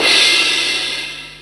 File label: DX High Crash